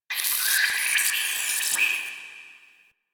Interior Vain Squeeze; Ambience; Misc Indoor; DE; Warframe; Infested Eris

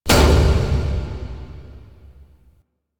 Circle Door Stop; Warframe; Entrati; Vaults

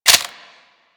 Clip In, Weapons; Warframe; Afuris

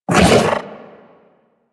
Companion Melee Swipe; Warframe; Pets; Zanuka; Foley

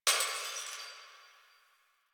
Distant Glass Breaking; Warframe; Ambience; Nineteen Ninety Nine

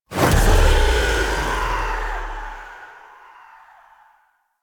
Edge Burst; Warframe; Warframes; Pacifist

